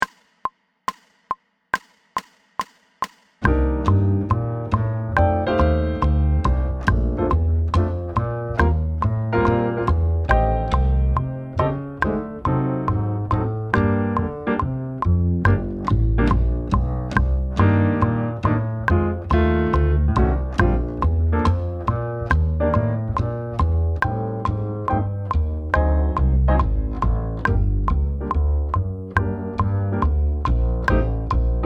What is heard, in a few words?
Jazz Drum Set Prepared